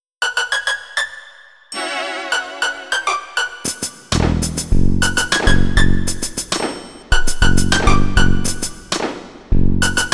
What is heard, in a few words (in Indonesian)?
Nada Dering Nokia Jadul R n B